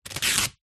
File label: industrial duct tape peel from wall